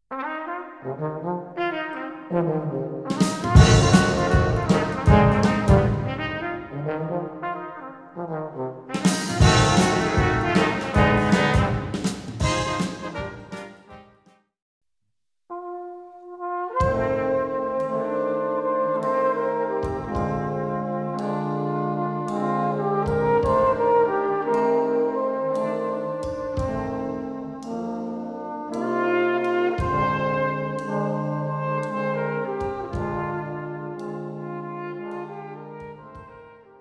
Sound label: riffs blues in three